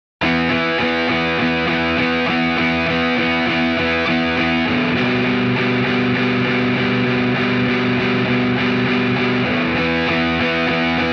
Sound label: Guitar Left